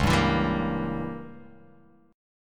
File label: C sharp Diminished 7th C sharp down Guitar Open F; C#dim7 strummed; JGuitar